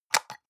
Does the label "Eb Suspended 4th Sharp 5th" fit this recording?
No